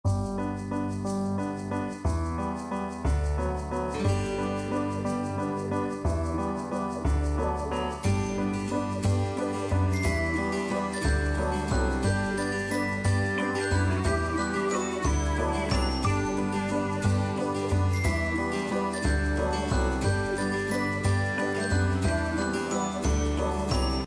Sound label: Music Menu